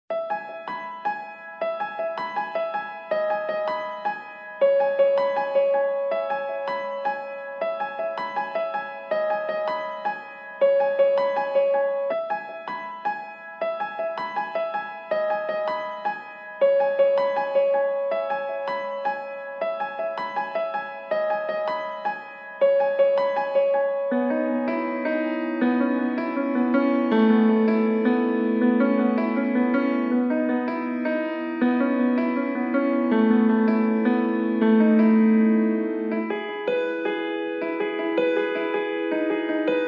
Lead Motif Only

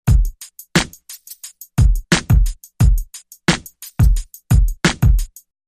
Drum Loop

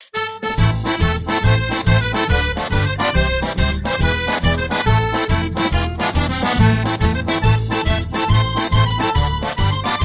Tarantella

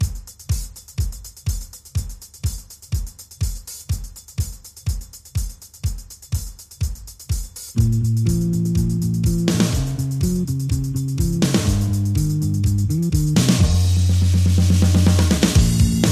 Drums and Bass Mix

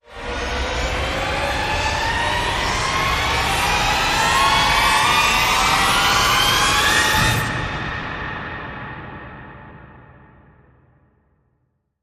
Rise Short

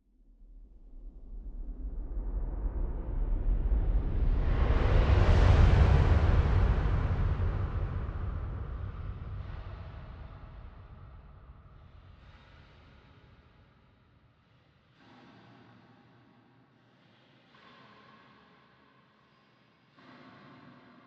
FX Shouts

